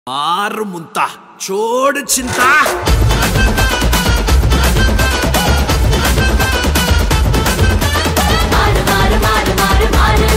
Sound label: bgm